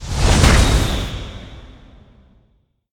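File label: power activate; smite; thanatos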